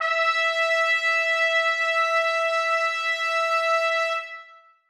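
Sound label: trumpets sustain e3; Sonatina Symphonic Orchestra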